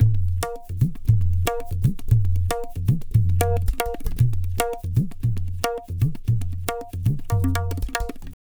Tabla, 115 BPM; Zero-G; Pure Tabla

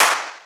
Verbclap 909

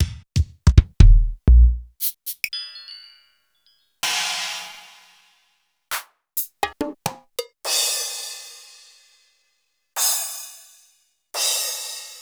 Boss JS-5

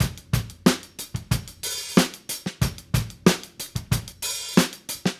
Call And Response Drums B, 70s Analog Funk; Sonic Foundry (Sony Creative Software); What it Is!

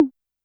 Tom Ana Clean, YAMAHA RM1X

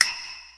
perc, Percussion; Trance Explosion Vol1

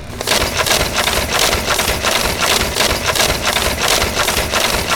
SORTING MACH; MACHINES

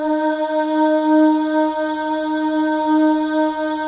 Voices, FEM AHS; Spectrasonics; FEM.2 AHS

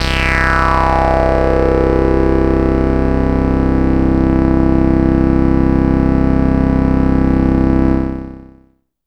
MOOG SYN, Synths

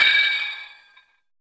SPARKOMATIC, FILM PERC; Drums In Your FACE!

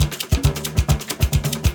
Groove 137; Dance Mega Add On Grooves

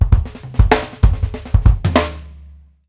DYNAMIC L, Drumworks FILLS 96; Sampleheads; New York City Drumworks VOL-1

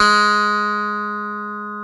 clavinet G#3; InVision Interactive; Lightware VOL-1; Instruments & Percussions

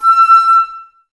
Flute, Woodwind

